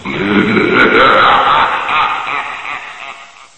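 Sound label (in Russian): жуткий смех одиночный короткий мужской в пространстве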